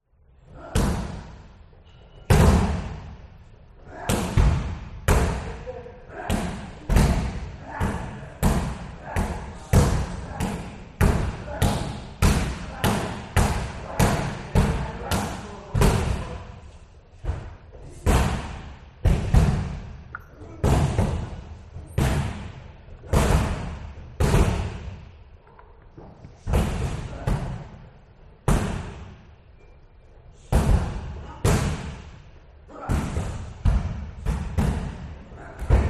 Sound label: Boxing Club Boxing Kicking Jumping Pushing; USA California